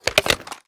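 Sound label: sniper reload empty mag out; gmod; iw8; xmike109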